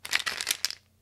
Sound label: scrabble